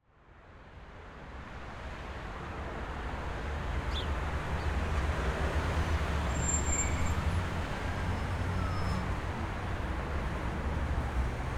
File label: City Streets, Traffic, Busy, Ambience, Siren; Cityscapes And Streets